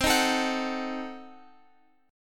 C Diminished 7th C, Guitar Open F; Cdim7 strummed

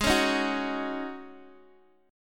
Ab 7th Suspended 4th Ab Guitar DADGAD; x,11,11,8,9,x

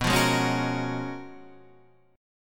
Db 13th B, guitar

